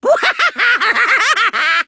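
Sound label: laugh english; monokuma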